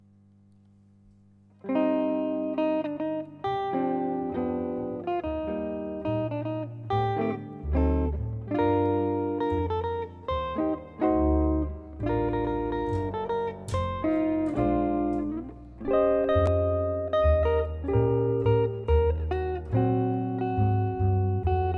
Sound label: Git Bass